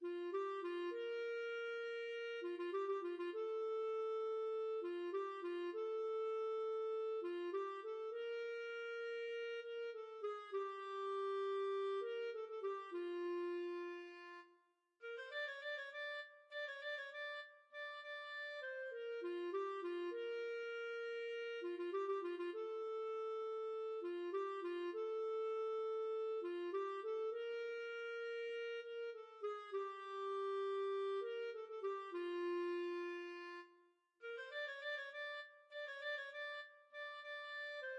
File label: down by the bay, clarinet